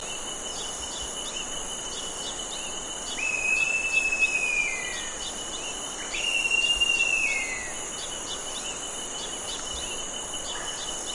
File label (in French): autour a longue queue, chant